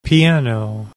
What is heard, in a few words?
PIANO